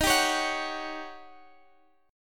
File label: Eb 7th Sharp 9th Eb, Guitar Drop D; strummed; x,x,13,12,14,14